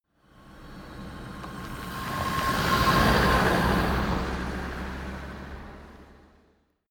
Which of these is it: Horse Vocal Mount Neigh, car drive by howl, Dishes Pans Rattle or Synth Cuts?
car drive by howl